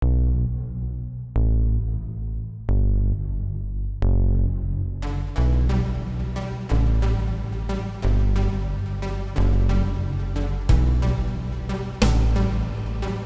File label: ditty